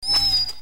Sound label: Grass Sword